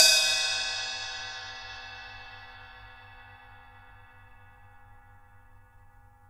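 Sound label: JAZZ KIT ride, Drums Acoustic; AKAI; JAZZKIT 1; 90s sample CD; USB Soundscan vol.10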